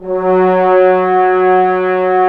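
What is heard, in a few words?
brass trombones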